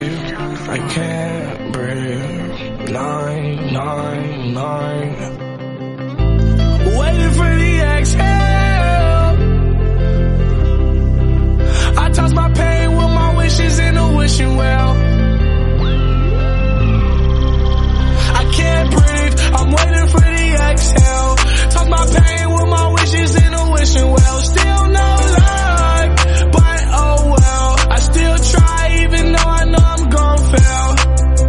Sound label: english song